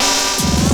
LOOP SD; Ueberschall; Drum & Bass Resonance

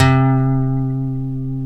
D, funk guitars

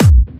Clubby Kicks, Bassdrums; Vengeance - Essential Clubsounds Vol.5

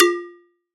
FX JUG BND; ModelCycles

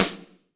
snare pop; Roland L-CD701; Snares 4; Sn Modules 4

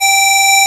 Aud Synth G5; Digital Sound Factory Xtreme Lead

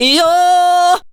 VOCAL LINES; Best Service; World Colours

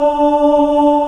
AH VOICE; B CHOIR

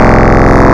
Distorted Primes, wavetables; Animoog

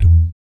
BS DUM, Vocal Planet Jazz & FX; ILIO; BASS DUMMS

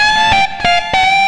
xtra lead, Leads; Sonic Foundry (Sony Creative Software); Ugly Remnants VOL-2

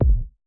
kick, Roland MC-909

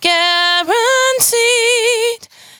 92bpm Bb, Velvet Vocal; Zero-G

